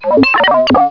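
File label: Synthetic sound; Vesmir; Synteticky zvuk; Revelation Sight and Sound SK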